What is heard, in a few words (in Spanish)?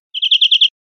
chorros cortos golpeados, verderon